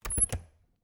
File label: Refridgerator, old fashioned, wooden, steel, brass clasps, hinges, close, squeek, piercing, wide; Eiravaein Works; Vaeyan IV